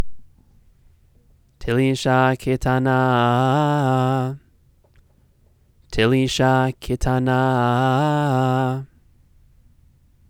telisha ketana; trope

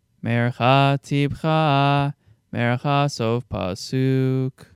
sof pasuk clause, trope; Trope Families

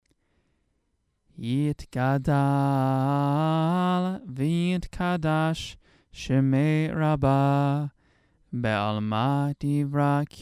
opening kaddish, shabbat morning; Musaf

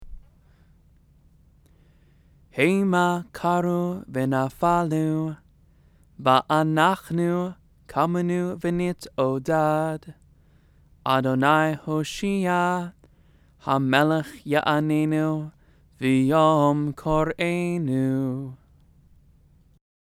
psalm 20, weekday morning

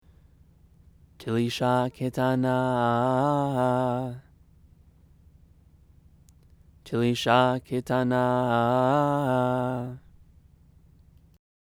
megillah; trope

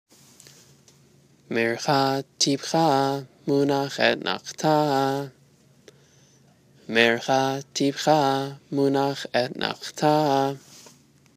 etnachta torah; trope

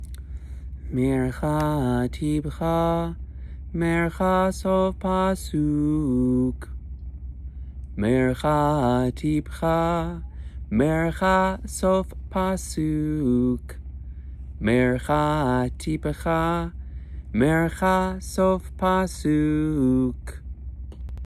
sof pasuk, trope; Trope Families

